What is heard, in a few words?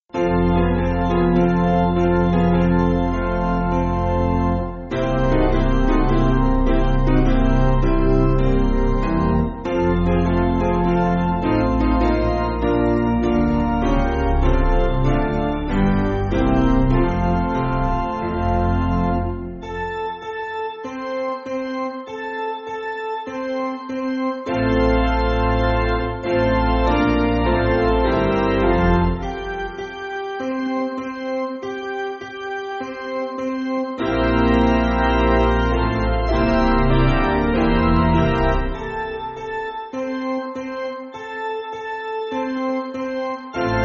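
Piano, Music Backing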